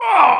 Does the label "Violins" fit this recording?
No